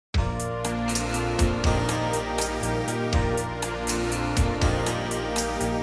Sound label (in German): Mitternachtsblues, Pan flute music